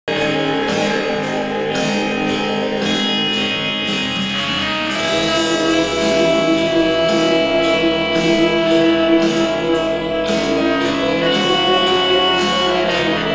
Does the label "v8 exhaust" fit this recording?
No